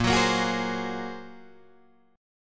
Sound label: A sharp 7th Suspended 2nd Sharp 5th, down, Guitar, Drop D